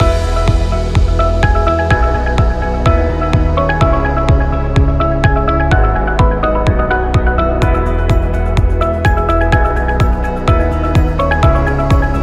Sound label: Background Loop; corporation